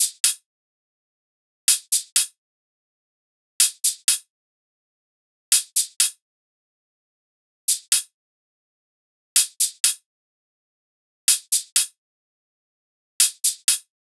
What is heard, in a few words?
loop hat 125; egeria